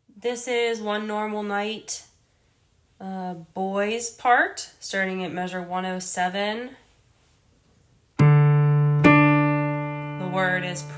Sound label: boys